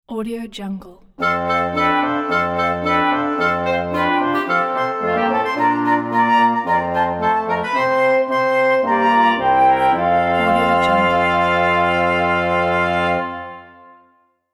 festive jingle bells ident reveal; drtimwaters

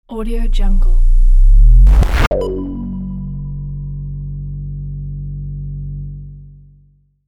bass transition

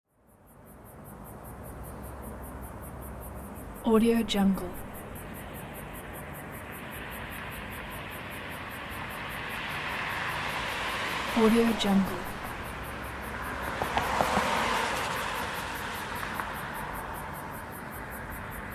city night ambience

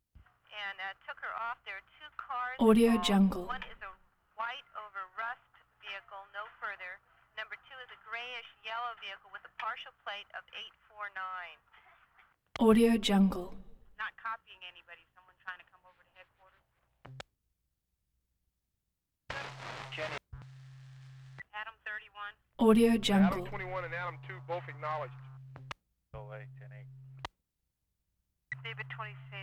san francisco police radio constant squelches

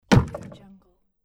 wooden shield break

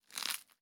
crackle rip tear celery

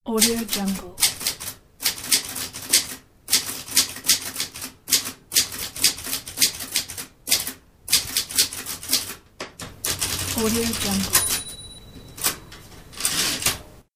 typewriter

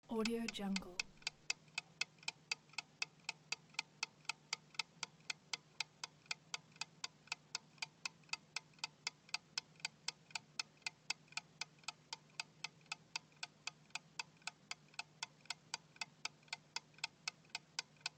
timer tick and ding

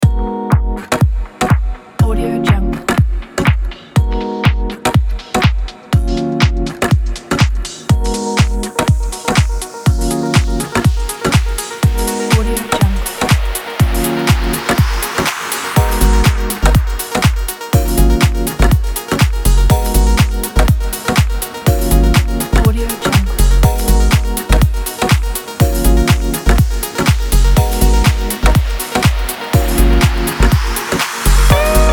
lounge house

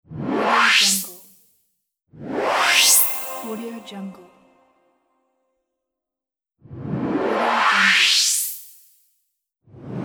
noise risers; mountainaudio

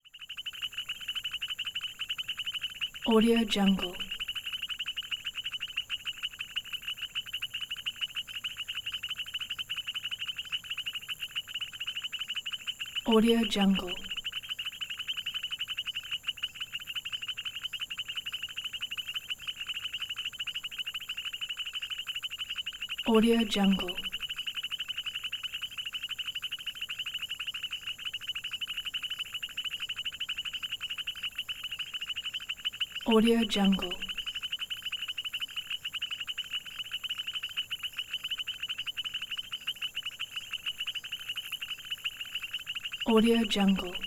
jungle frogs; prosoundfx

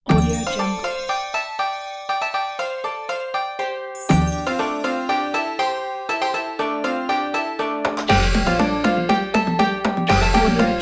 powerful chinese ident

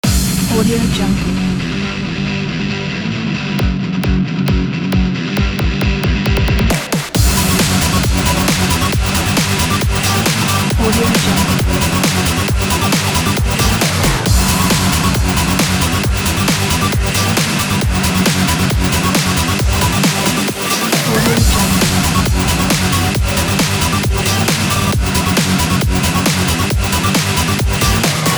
fast aggressive hard rock electronica

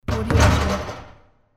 basketball hitting backboard

